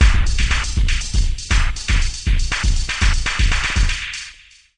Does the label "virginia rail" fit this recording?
No